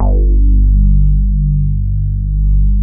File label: RAP BASS G1